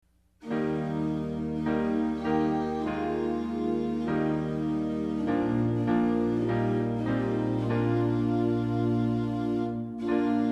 SDA HYMNAL PRAISE AND WORSHIP SONGS INSTRUMENTAL TUNE